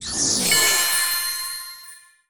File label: Card Exp Success